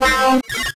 Science Fiction Sci-Fi Electronic Monitor Power Down